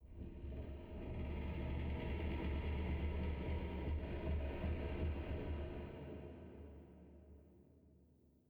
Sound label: sting metal groan; Rust